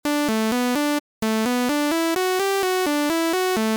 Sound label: attack noise